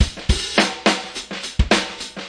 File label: 106 splish splash, drum loops and breaks; 101 to 110 bpm